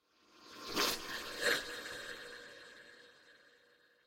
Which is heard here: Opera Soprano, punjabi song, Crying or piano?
Crying